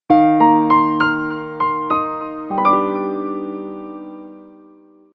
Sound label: arpeggio, notifications; Doov D9